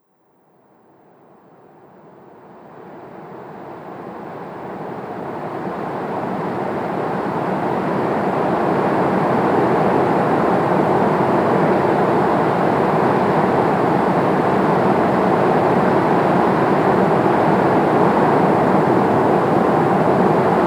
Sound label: nap time white noise